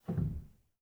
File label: rel, Grand Piano; bitKlavier Grand; Piano Bar